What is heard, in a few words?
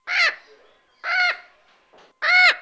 bird chirp mexican red parot